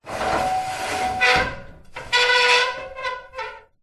industrial ladder metal slide movement screech metal